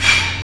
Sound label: METAL, PRC METAL & WOOD; Roland - LA Composer vol. 1